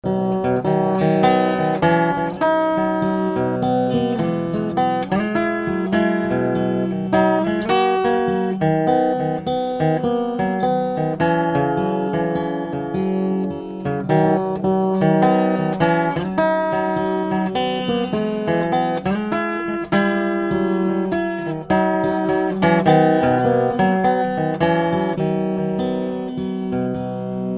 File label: Guitar Tuning Single